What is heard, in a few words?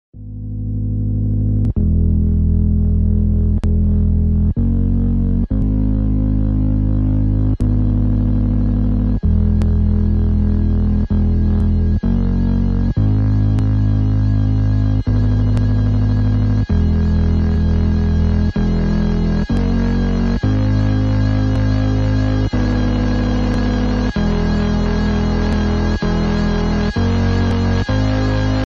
some chords, ringtone